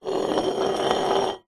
food drink can soda empty slide long table

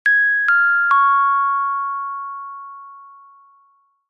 public places announcement tone bell dry

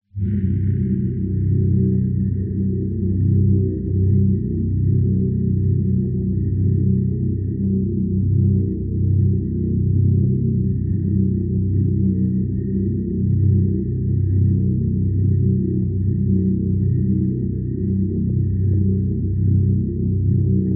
vehicle underwater or space resonation; lukas tvrdon

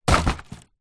wood pieces thin plywood set down on ground

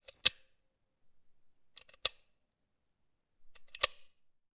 gun carl gustav m45 swedish k; still north media

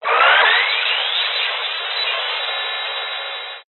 science fiction radio signal interference burst

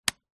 household external mains switch on

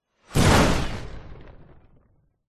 bomb whizz in hit close by explosion med

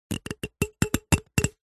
comb metal fingers run through teeth; zapsplat

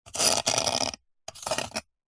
nature ice block sharp knife scrape

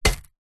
stones small wood batton impact; foley

